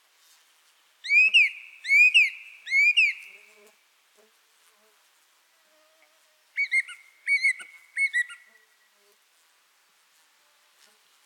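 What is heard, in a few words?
pevchiy drozd golos, song thrush voice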